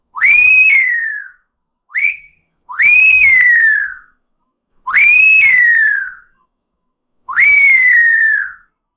catcall whistle

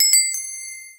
ui

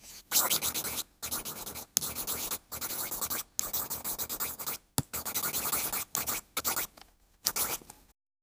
long line drawing

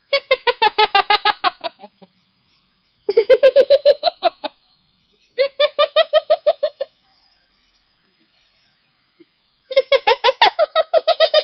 small monkey laughing hap

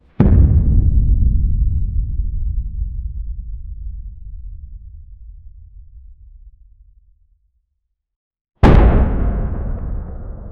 lo fi muffled underground, battlefield

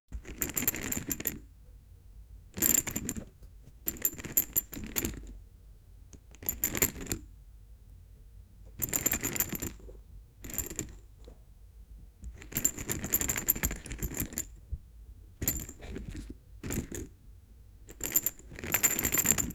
searching for phone in a, rummaging

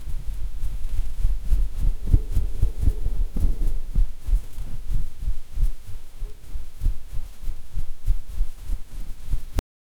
rhythmic clothes rustle; fabric

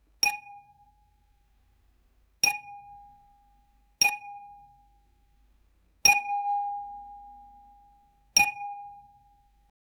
a object of glass being, echo

